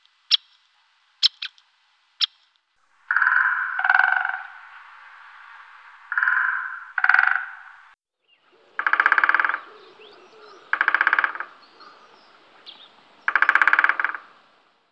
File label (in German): Buntspecht